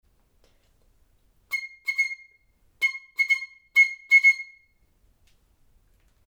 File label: piccolo